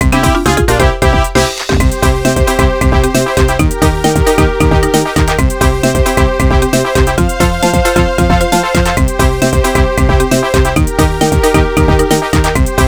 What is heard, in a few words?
Victory!; Mario & Luigi: Partners in Time